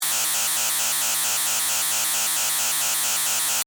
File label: Alarm Hazardous, Scifi, Alarm, Hazardous, Waste, Science, Fiction; Tech; Blast Wave